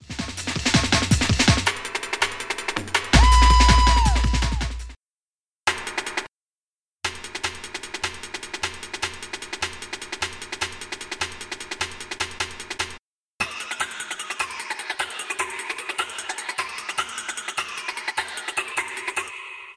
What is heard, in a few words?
Break